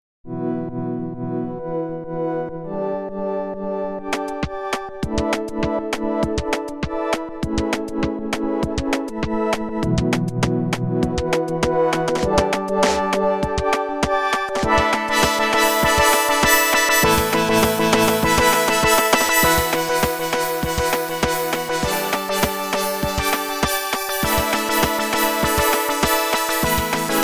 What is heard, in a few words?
Butter Pad; Sawer